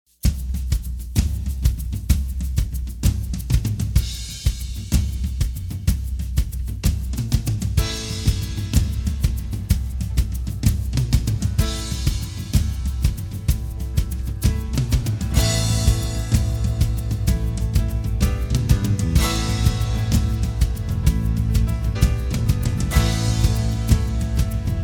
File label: An Irish Gospel Acclamation